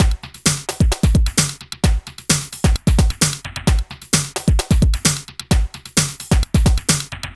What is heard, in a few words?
Drum Loops